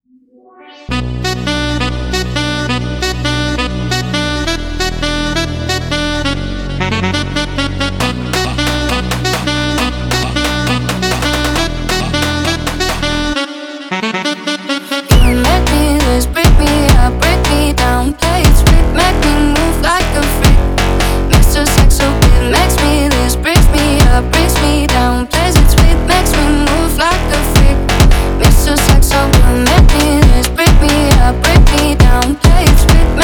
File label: funk